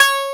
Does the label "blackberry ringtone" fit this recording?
No